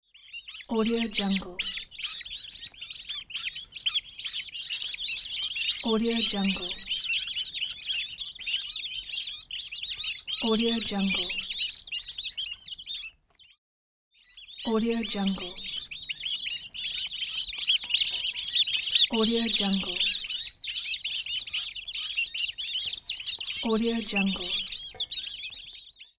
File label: chicks chirping; am-bi-ence